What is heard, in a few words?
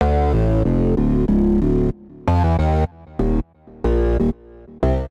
Grind Bass D Minor 94 bpm; MIDI Clips; Tonal; Bassline; Ableton; Factory Packs; Chop and Swing